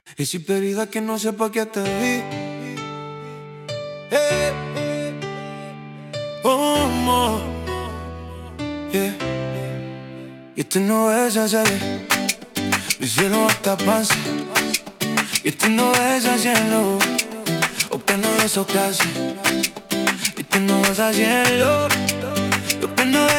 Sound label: energetic reggaeton piano danceable; for real estate